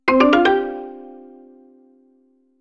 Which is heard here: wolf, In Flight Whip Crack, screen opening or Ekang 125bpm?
screen opening